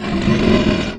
monster roar

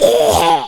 fly cough

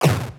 poop death; horizontalrunner

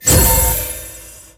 crystal ball

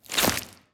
face smile hide; gurdy

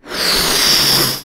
inflate

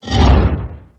dash; maskinfamy

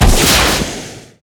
rocket launch short

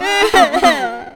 scared whimper

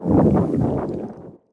growl medium; fistula

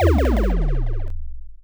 death tone; horizontalrunner

